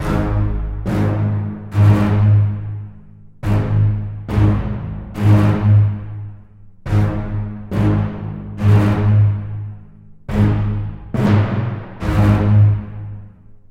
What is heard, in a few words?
Casino Action; Duke Nukem Forever